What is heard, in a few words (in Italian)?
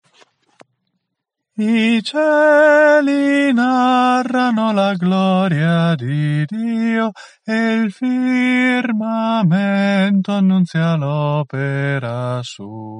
ritornello bassi, coro